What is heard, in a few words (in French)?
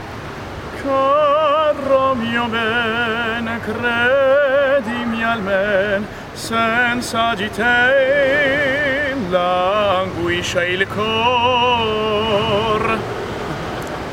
chant berceuse